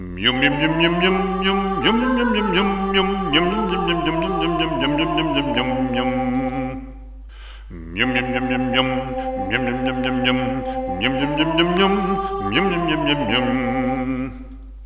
Vocalise

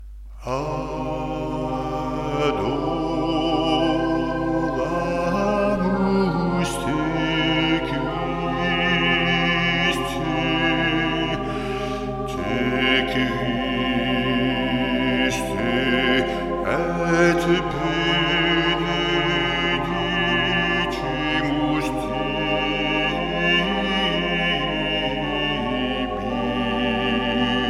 Adoramus te, choeurs; Gasparini

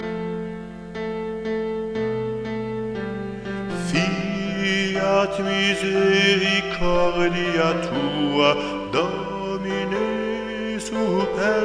Te Deum; Charpentier

